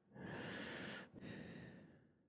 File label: BreathLoop; horror game; African myth–driven horror game; Tunde; OKÚNKÚN; In-Development PC/Console Game